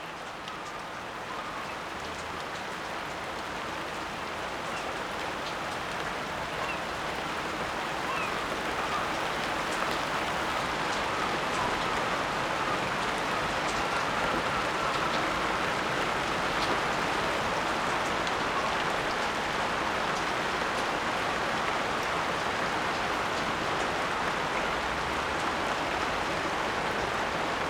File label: rain in the jungle